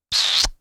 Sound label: Cartoon Smooch